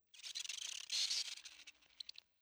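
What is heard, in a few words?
ambience generic sounds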